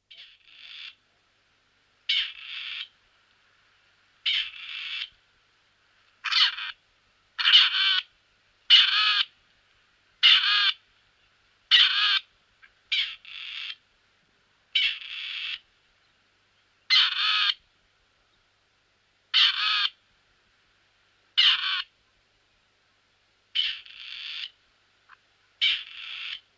Rebhuhn

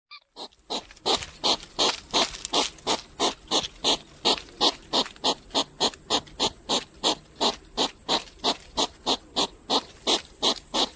Igel

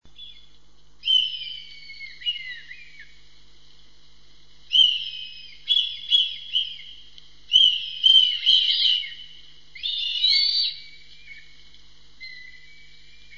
Rotmilan

